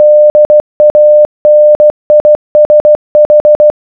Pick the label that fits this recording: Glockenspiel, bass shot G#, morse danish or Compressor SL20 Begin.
morse danish